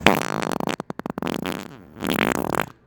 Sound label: Fart